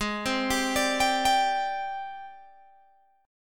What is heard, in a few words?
G sharp Major 7th Flat 5th, Guitar, Open G; 18,17,17,19,20,17